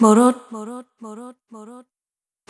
Single Voice Melodic Loops

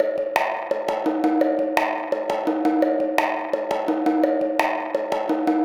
Metal Tank Bongo, processed; Sonic Foundry (Sony Creative Software)

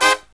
HI CHORD, Brass

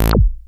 SHORT ACID, BASSES

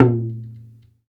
DAIKO, World Dance; Spectrasonics; Supreme Beats

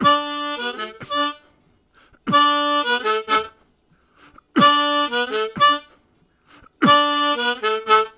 Octave Cough, Harmonica; Zero G

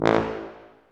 TUBA, Tuba stacc; Roland L-CDX-03 Disk 2

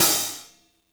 hihat - opened; JazzFunkKit

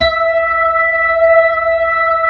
B-3, Blues

